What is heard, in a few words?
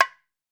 rosewood, African drums; E-MU Formula 4000 Series Vol. 4; Earth Tones